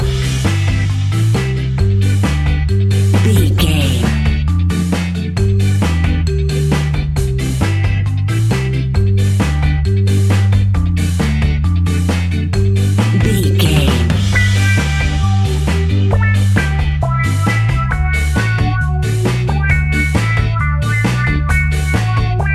Classic Carribean island ska reggae music! Classic reggae music with that skank bounce reggae feeling.